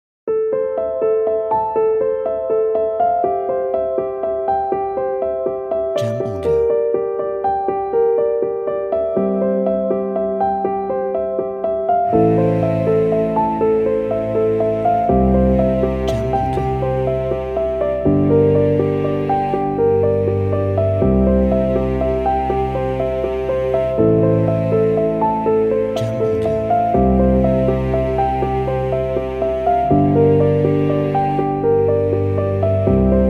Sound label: Emotional Piano